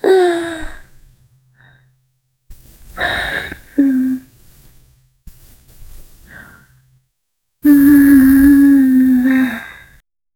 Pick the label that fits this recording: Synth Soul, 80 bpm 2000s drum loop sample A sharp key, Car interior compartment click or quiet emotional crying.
quiet emotional crying